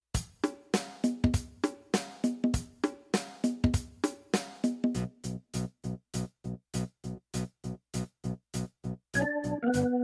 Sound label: Smky Girl, pop